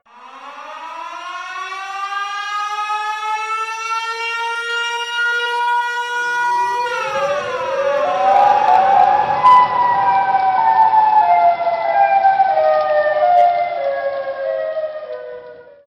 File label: siren warning end; ff2; siren head